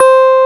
PUNCHREEDS; Wizoo - Powered DX

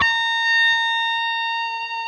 PRS lead

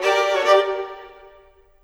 Viola Violin, Orchestral Series Rock and Pop; Sonic Foundry (Sony Creative Software); Orchestral Series VOL-4 - Rock and Pop

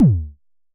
Unce Unce Kick D2, Vermona Kick Lancet; LookIMadeAThing Kicks

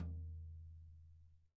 GTom, E-MU PX-7

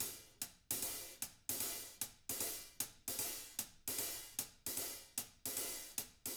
HIHAT LOOPS, Living Drums; Sampleheads; Peter Erskine

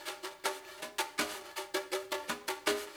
BRSH CGA; Spectrasonics; Liquid Grooves 1 & 2; RESOSOUL

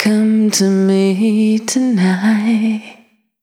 Invitation Only, Single Voice Melodic Loops; Singles

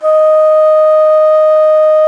BONSURI, India Winds; E-MU Producer Series Vol. 7 – Old World Instruments

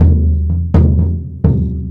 Percussion Loops, Brazilian; Houseworx

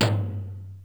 Tom Low, Yamaha DD-7

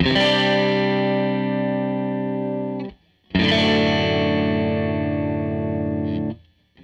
Strat Guitar Loop

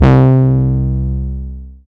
SYN, analog

